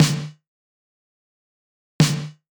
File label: Glow Snare A